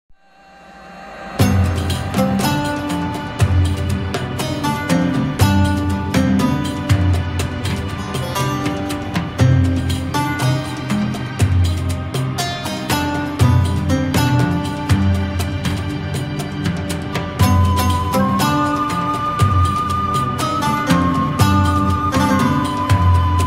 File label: Ramadan background music